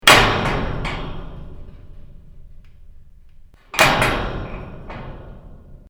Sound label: Contact Mic Metal Door